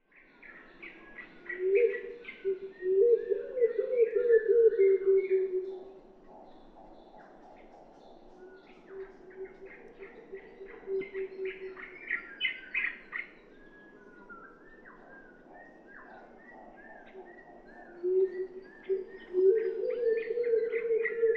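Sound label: Columba delegorguei, pigeon; vinno krasnyy golub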